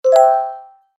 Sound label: small magic blink